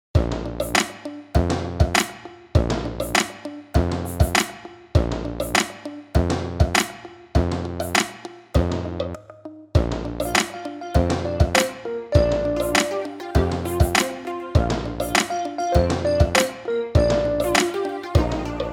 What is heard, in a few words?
shapes karaoke